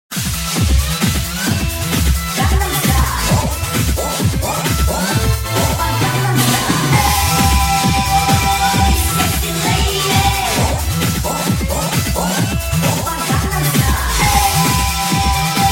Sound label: Anger